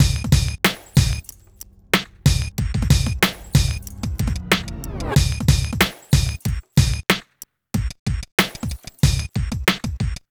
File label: Beat